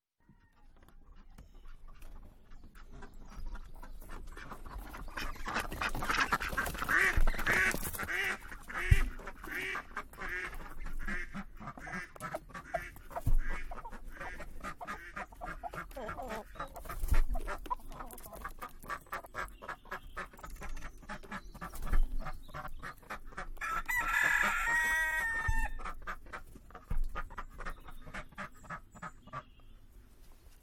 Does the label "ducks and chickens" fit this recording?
Yes